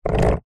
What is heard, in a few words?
household chair sofa drag concrete wooden legs scrape